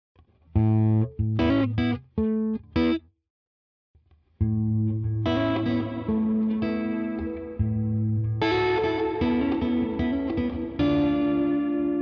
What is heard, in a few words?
UAD DelVerb Hall